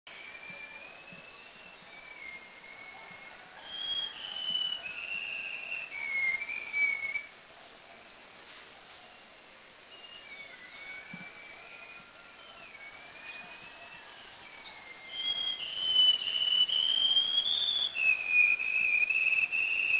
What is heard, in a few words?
Black Shama Tabunan; Black Shamas heard